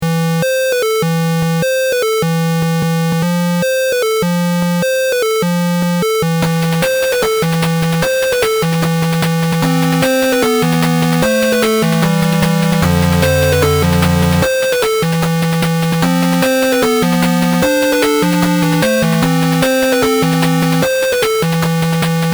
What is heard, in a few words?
oldies 8bit racing